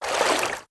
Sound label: water